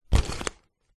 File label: game open leather bag mechanical gear mechanism accent; ancient game; epic stock media